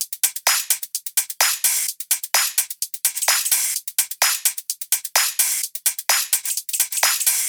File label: Shuffle Top Drum Loop, 128 BPM; Drums; Loops; House